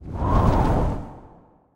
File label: wind Element Pass By; elements